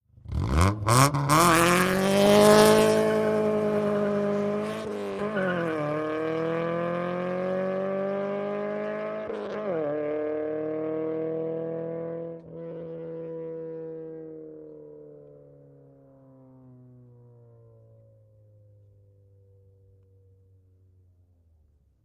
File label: Integra Rev Peel Out, Acura Integra ext.